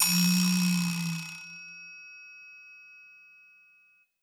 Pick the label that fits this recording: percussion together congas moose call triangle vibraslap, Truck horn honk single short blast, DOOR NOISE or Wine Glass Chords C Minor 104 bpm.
percussion together congas moose call triangle vibraslap